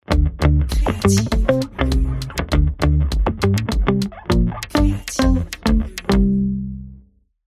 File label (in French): basse